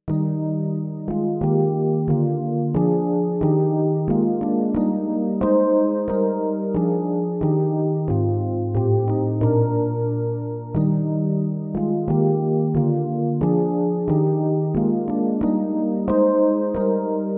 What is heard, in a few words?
Deutschlandlied German National Anthem